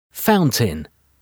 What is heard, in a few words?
fountain